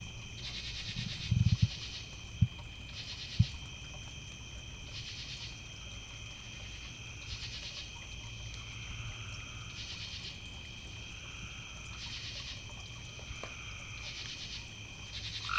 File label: frogs in the evening